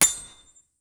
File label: flat metal sign impact; impacts